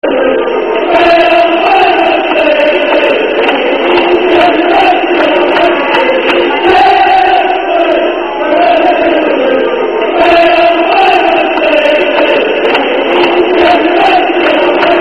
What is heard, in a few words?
Chelsea Chant, Carefree